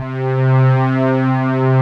LEAD; Pro-Rec - Synthsonic

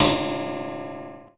FRIDGERA, PERCMETALIC; Rarefaction; Poke in The Ear With A Sharp Stick

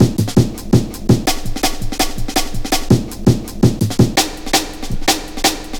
Pride, Drum Loops, 165.5, Jungle; Zero-G Jungle Warfare 1, 2 & 3

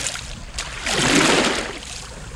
ROW, Boat Rowing

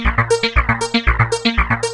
ARP PING; OTHER SYNTHS; AX-60; Rubber Chicken Software Sample Bank

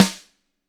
GRCH HI, GRETSCH SNR; ILIO; Double Platinum Drums 1

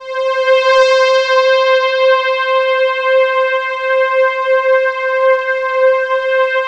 DENSE FL, PADS; Masterbits; Vintage Voltage

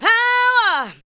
ADLIBS 90; Ueberschall; Jam Box

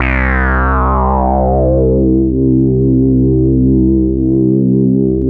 Oscar bass

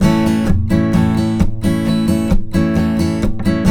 RIFF, Blues Guitar; ILIO; Fretworks

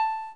Crickets; Final Fantasy 4

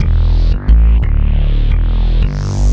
Synth Bass; Bass X

